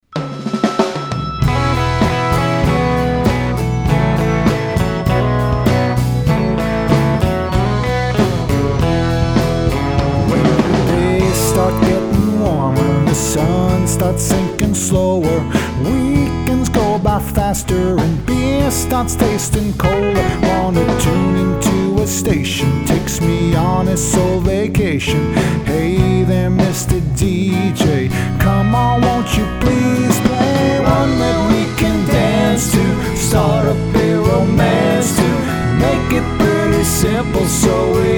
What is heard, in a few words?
groovy little summer song, country